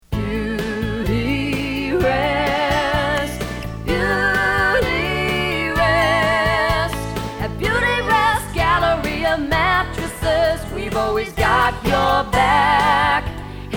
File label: jingles